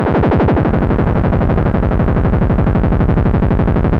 monodelay Fm 102, Loudest frequency 334 Hz Recorded with monotron delay and monotron - analogue ribbo; instruments; loops